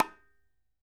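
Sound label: KHOL HEAD, DRUMS OF IND; AKAI S6000 CD-ROM; WorldSounds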